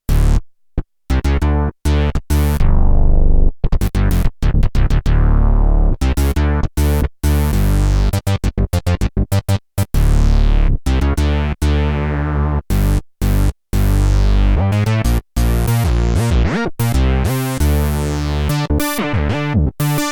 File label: Synthadelic Bass, Roland JD-800; Factory Patches